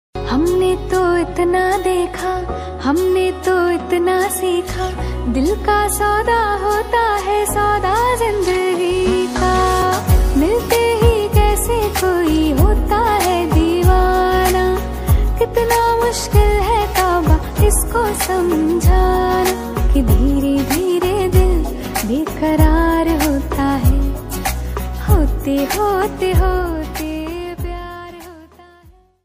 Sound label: Ringtone of Romantic Song in Hindi; ringtones